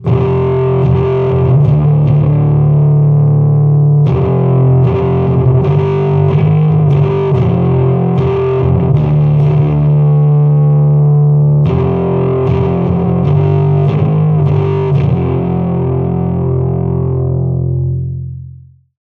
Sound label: Distortion after